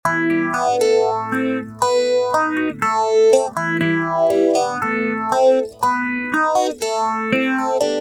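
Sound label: acoustic guitar; nady ps019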